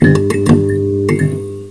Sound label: neon on; sven; caged